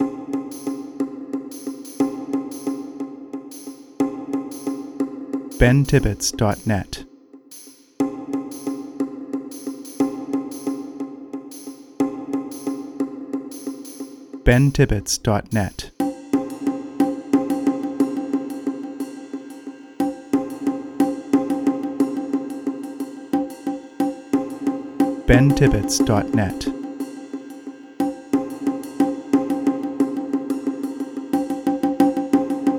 percussion only

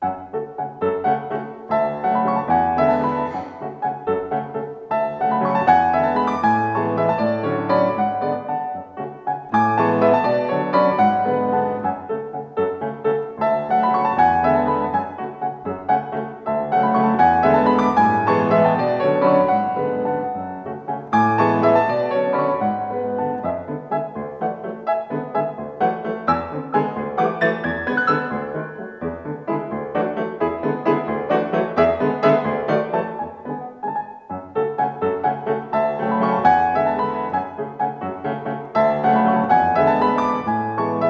waltz

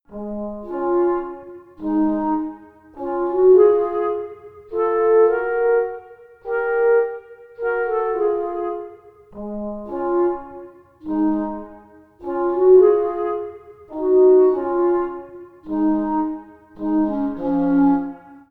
duet for trombone and clarinet

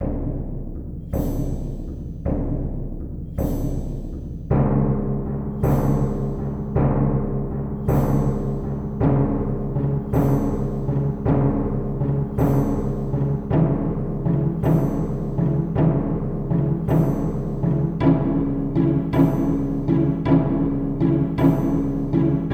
ominous advance, chiptunes

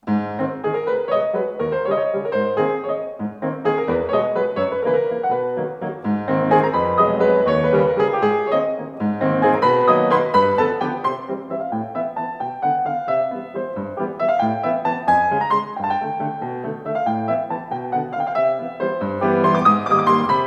waltz

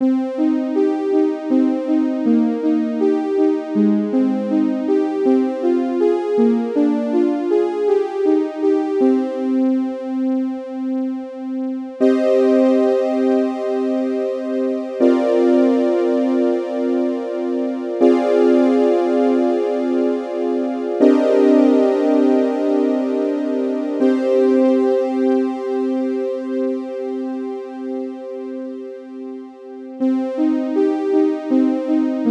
short piece for synth; juvenilia; melodyassistant

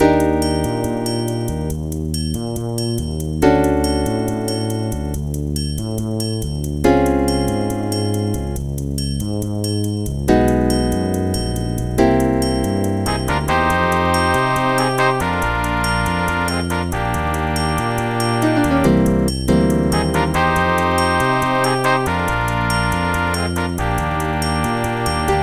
bossa

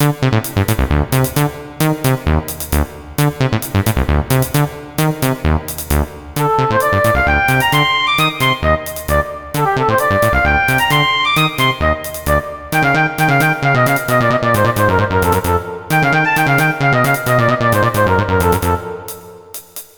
grapple, chiptunes

